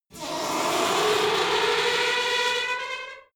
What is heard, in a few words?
elevator old squeak move start short